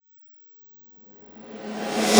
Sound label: Snare Shard; ZeroGravity Drum Shards